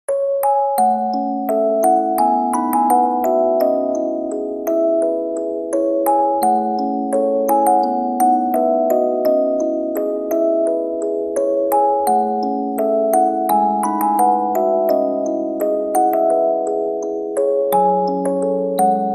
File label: Rain; FMAB